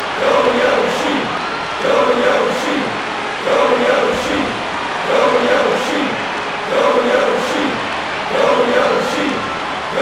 Crowd Song; Yoshi's Crowd Song; mario strikers charged gamerip

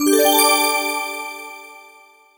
Save Load

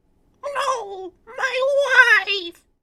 Male Reaction

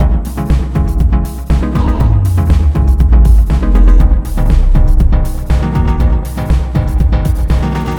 loop root-d; Mindcubes: Inside the Twisted Gravity Puzzle

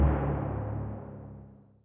Tymp

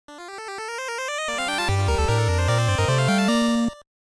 Cape get jingle w bass; Shutshimi

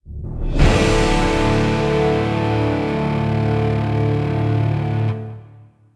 Sting Ui Mission Failure

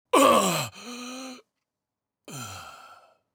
Voices male death grunt; Out of Reach: Treasure Royale

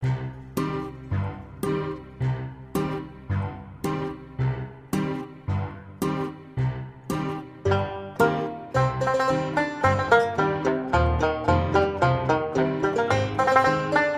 Loop; Rango; PS3

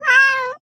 Cat Meow

